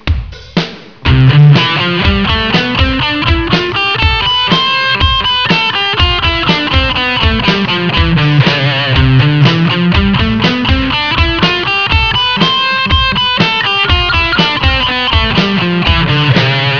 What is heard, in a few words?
c maj 8th